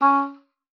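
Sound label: C#4, oboe d'amore; Xsample VOL-7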